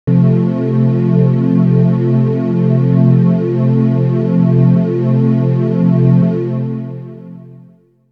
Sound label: sample pads, jungle; Jungle Frenzy 1